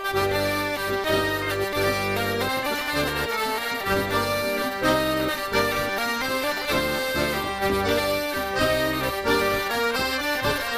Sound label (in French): Bourree 2 tps, Bourree de montfort